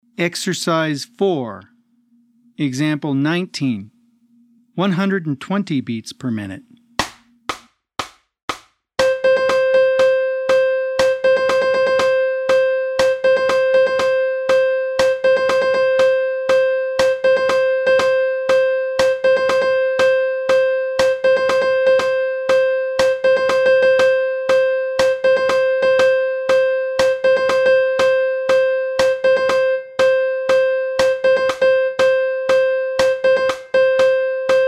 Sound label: 120 BPM